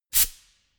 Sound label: pneumatic pump short burst